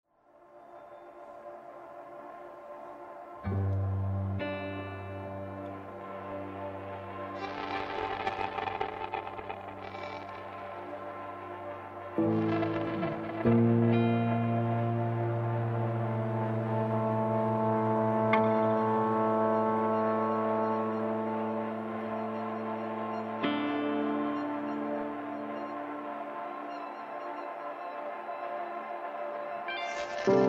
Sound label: noise; the Garden